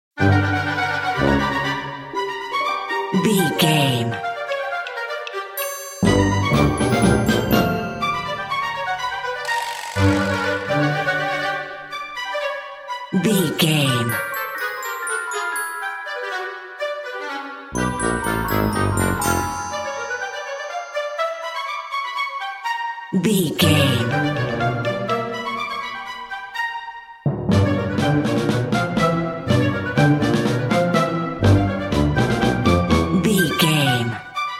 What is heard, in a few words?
zany overture